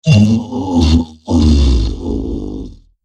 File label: die, troll